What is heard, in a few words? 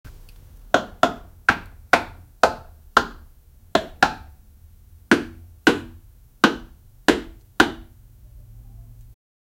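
punching sound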